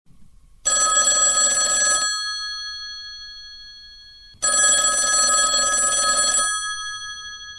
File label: telephone ring